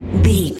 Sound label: ui menu button error